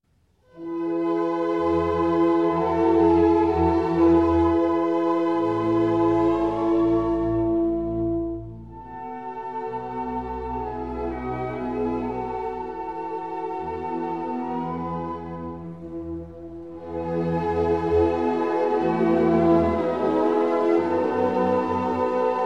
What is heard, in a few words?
Adagio For Violin and Orchestra in E Major; David Oistrakh; Mozart